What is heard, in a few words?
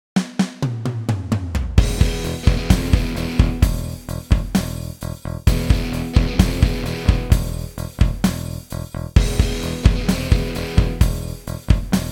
JamTrack